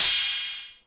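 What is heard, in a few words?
Yamaha RS7000 hi-hat cymbal E6; Drum machines; Yamaha RS7000 Cymbals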